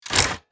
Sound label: Casino slot machine reel start